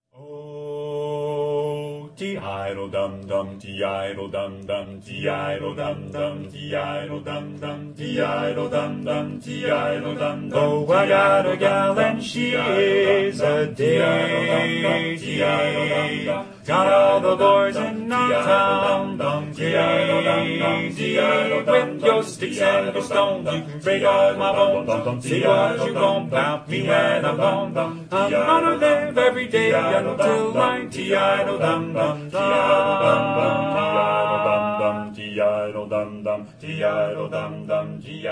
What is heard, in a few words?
a cappella barbershop quartet one man multitrack